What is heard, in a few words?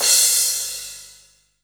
Crash, Cymbals; Vengeance Samples